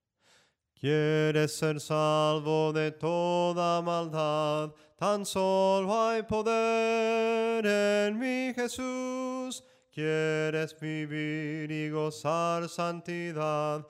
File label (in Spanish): SOPRANO CANTADO, himnos en melodia soprano